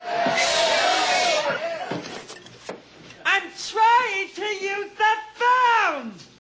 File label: phone